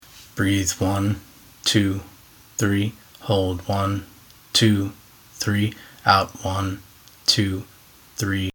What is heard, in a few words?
Breathe 3-3-6